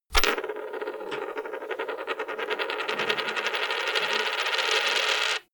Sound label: coin spin wood table